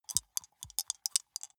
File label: small two rattle in hand closed, wuerfel